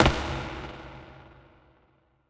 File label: cave grounds fall; Golden Crayon Sounds